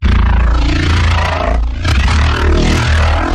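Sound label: creature shiver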